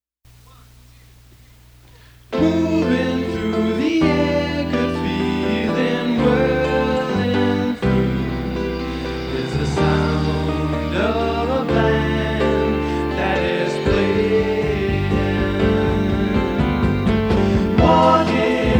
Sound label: Tech Hi-Fi Commercial